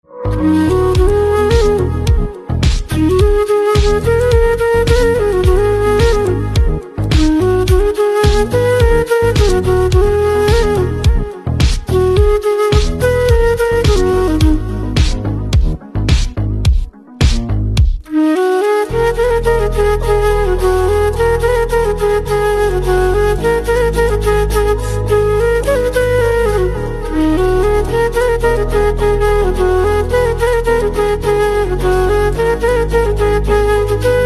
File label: Flute Instrumental Ringtone